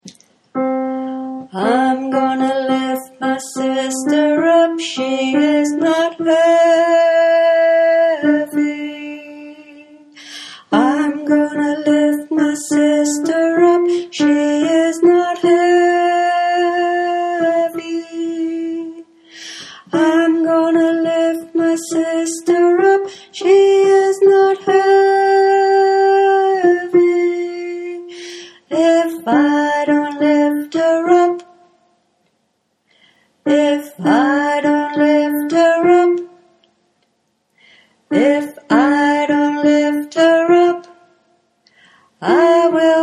I'm Gonna Lift alto bass, choir

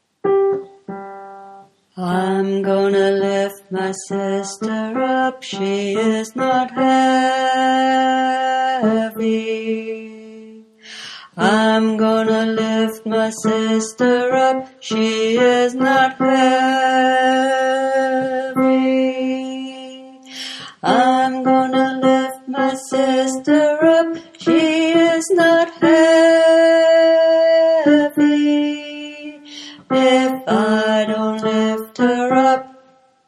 I'm Gonna Lift tenor, choir

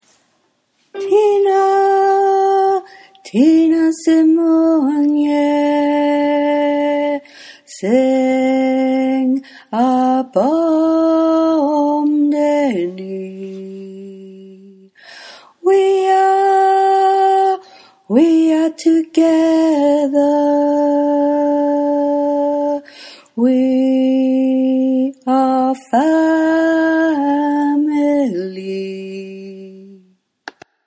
Thina Simunye bass, choir

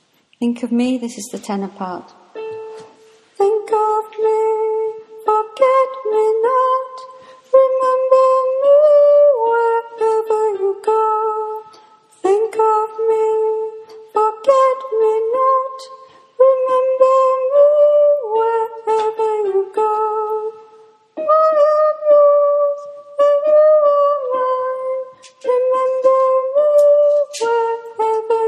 tenor

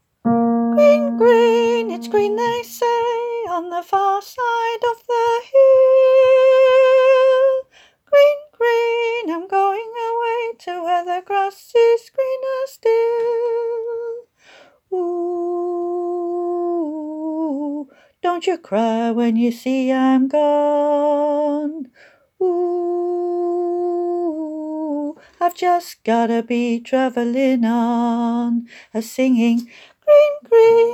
melody

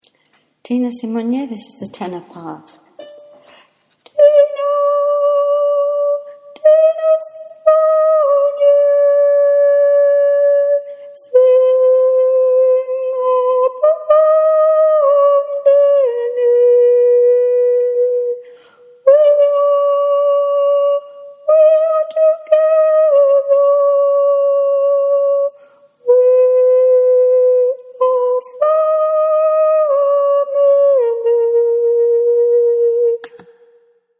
Thina Simunye tenor, choir

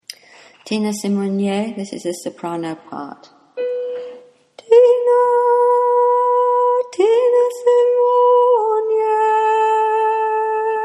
Thina Simunye soprano, choir

